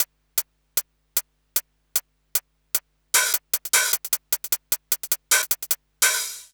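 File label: COO hi-hat, drum loop; Big Fish Audio; Uncivilised G'rooves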